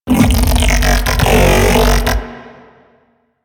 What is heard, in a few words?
Mech Ambient Vocal